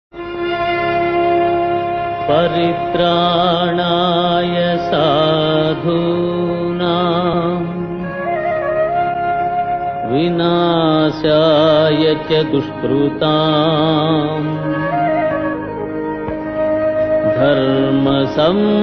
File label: paritranaya aadhunam padyam, telugu